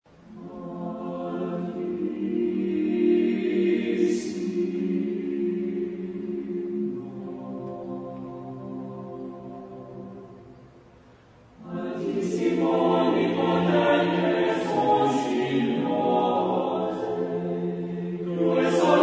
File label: Sonnengesang, chorus; Eben; Cantico Delle Creature